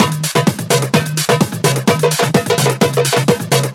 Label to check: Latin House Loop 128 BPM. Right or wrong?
Right